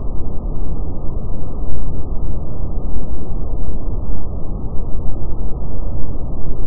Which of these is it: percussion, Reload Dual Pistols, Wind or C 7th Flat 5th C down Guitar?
Wind